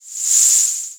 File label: snake